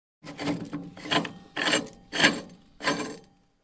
Screw On metal ring

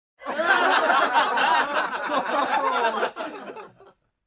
Gathered Adults Laughing

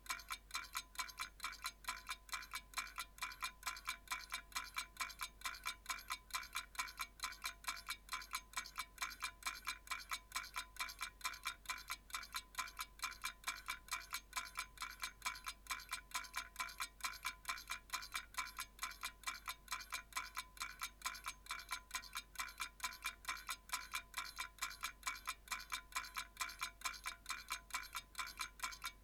Clock ticking faster

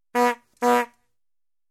Vintage Manual Air Horn

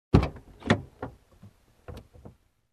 Open Car Door

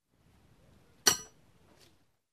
Horse hoof sound

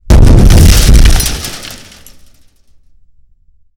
Heavy blast with debris flying

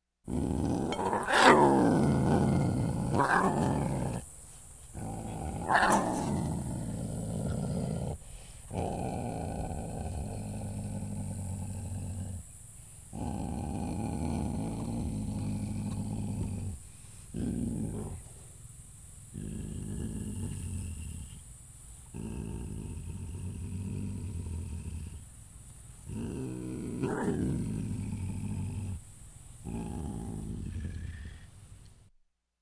Wildcat hissing menacingly

